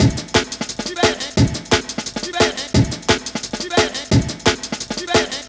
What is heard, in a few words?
175, classic dnb breaks